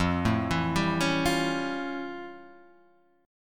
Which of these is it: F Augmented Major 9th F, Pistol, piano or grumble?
F Augmented Major 9th F